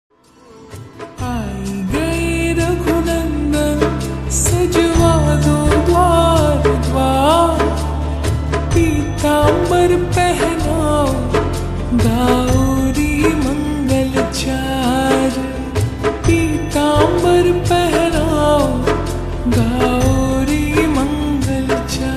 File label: Slowed Reverb Ringtone